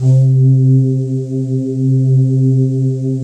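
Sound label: PAD, atmospheric pads; Best Service; XX Large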